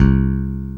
P-BASS

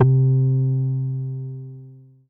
OSCTKBS C3, Vintage Keyboards; Big Fish Audio - First Call Vintage Keyboards; OSCAR

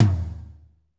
tom, lite jazz, Roland; Northstar; Drumscapes Roland; AC Lite Jazz; Toms

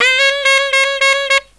SAX, Killer Brass Riffs; USB Soundscan vol.29 - Killer Brass Riffs; AKAI

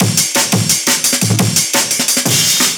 breaks breakcore

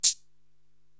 Thin Shaker, Korg TR-Rack

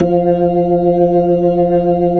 B-3, Jazz

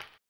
HAMBONE; ILIO; Vocal Planet; Blues & Soul

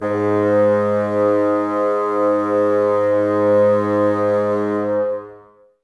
Bassoons G#2; Miroslav Vitous - Woodwind Brass Ensemble

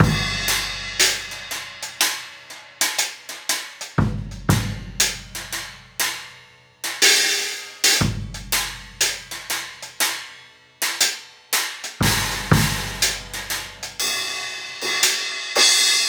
Cymbal and Kick

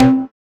Synths Root C; Vengeance - Essential Clubsounds Vol.4; Synth Shots and Basses; Note C

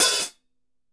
OPEN HAT, HI-HATS; Sampleheads; New York City Drumworks VOL-1; KD HI-HATS

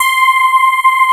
STRIA, Twisted Textures; STRYNGI

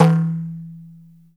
INDIAN DR MF2; Spectrasonics; Heart of Asia; INDIAN SET

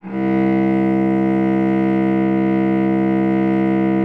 C2, cello vib; Xsample VOL-7

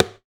Plastic Box, household rhythm samples Hits

